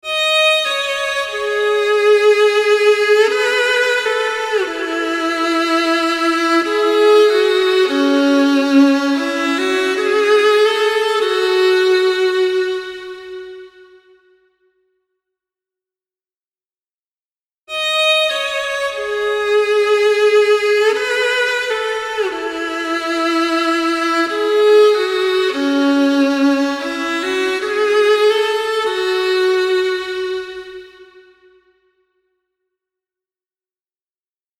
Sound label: solo violin wet variations